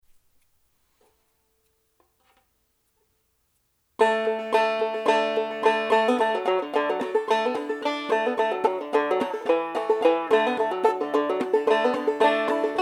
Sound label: banjo; Soldier's Joy